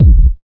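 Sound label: 125 drum 2 R, Fresh Disco House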